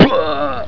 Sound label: fall; quake2; postal